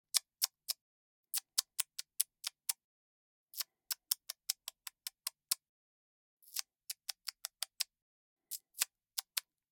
SMACKING TONGUE ON ROOF OF MOUTH CHIPMUNK SQUIRREL NIBBLING MULTIPLE NIBBLES EATING FOOD; The Mouth